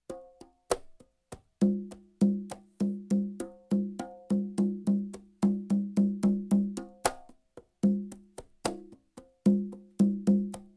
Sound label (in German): Suedsee Longsamer Conga Rhytmus